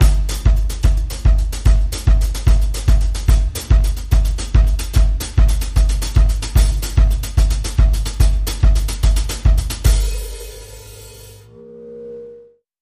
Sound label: Breaker Action C Drums Only